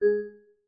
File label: Class Harp, notifications; Oppo Find X5